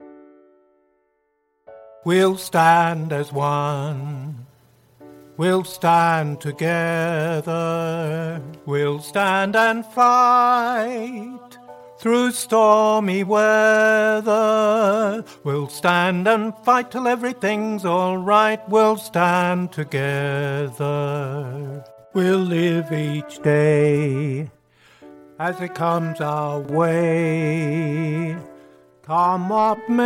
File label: Tenor Harmony synch